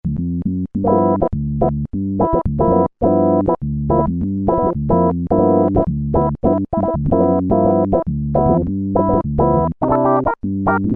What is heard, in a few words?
Contact Wah, Roland Juno-106; Factory Preset